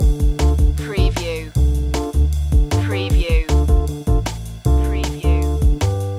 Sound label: jazz pool loop short